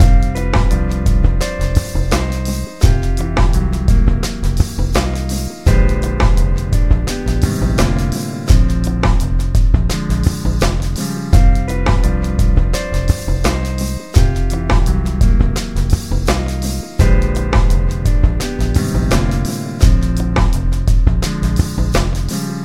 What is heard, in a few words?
Jazz Loops